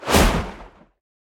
flame whoosh; Smite; Sol

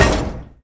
shield hit; smite; Ares

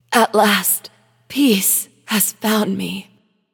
death; smite; themorrigan

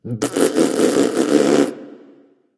tounge; smite; voicepack; osiris

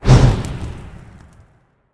swing; smite; guanyu

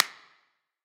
clap; smite; god sol

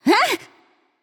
surprised; smite; izanami

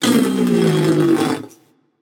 tongue passion; chaac

